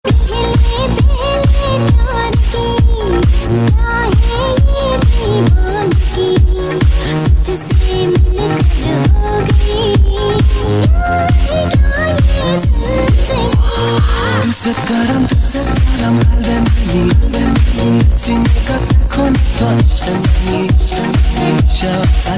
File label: Techno Of Egyptian